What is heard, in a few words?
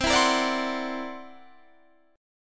C 9th Flat 5th C, C 9th Flat 5th chord